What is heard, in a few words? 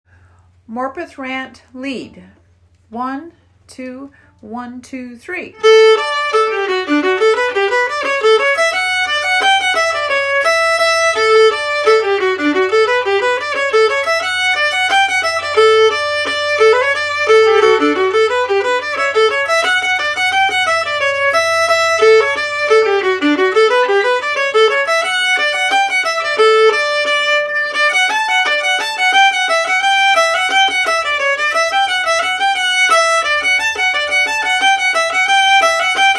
Morpeth Rant lead